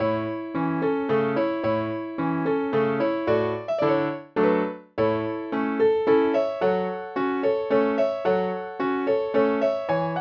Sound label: walzer, cello ensemble